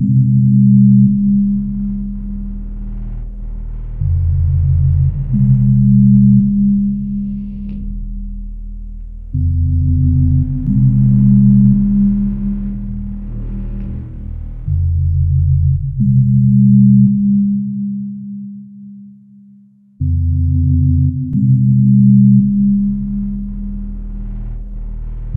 drearywoods rumble

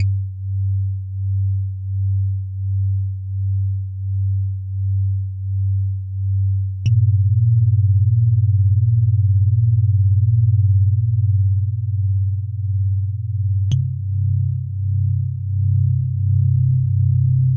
bassy bass

